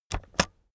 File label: keyboard external space press slow